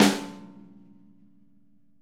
snare, Interactive Drum Kits; Sonic Reality

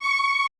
QVIB F#C5, Orchestra; AKAI Advance Orchestra Upgrade 97 Vol.1

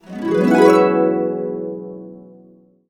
E, Harps; USB Soundscan vol.62; AKAI

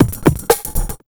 ROCK 132 loop, Big Beat Drumloops

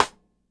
SIDESTICKS

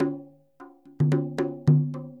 MLY DRMS; Spectrasonics - Heart of Asia CD2

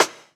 Snare, jungle breaks drum sounds

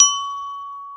C#4 glock; InVision Interactive; Lightware VOL-1; Instruments & Percussions; GLOCKENSPIEL

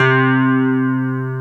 HONNER C3, Keyboards Old School; USB Soundscan vol.09; AKAI

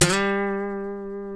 Guitars FUNK; Spectrasonics; Hans Zimmer Guitars VOL-1; TAKA FUNK

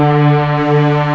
LOW STR, LOSTRING; M8; CMI Factory

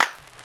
Clap Lite Dirty; Lost Tapes From Mars; Clap & Rim

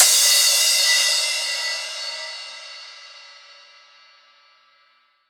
Crash, Cymbals

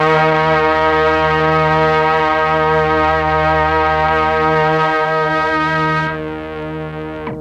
MEL STRING; ILIO; Propeller Island

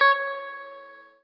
Guitar & Bass; Quantum Leap; 90's Sample CDs

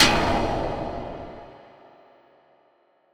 big clank

